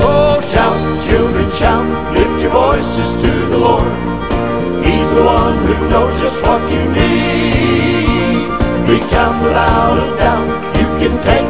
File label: shout